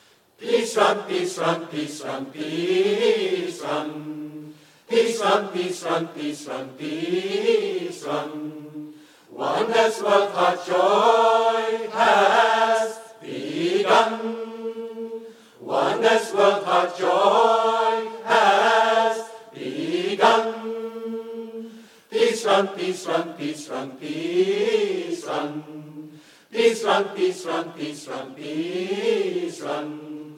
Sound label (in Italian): canto peace run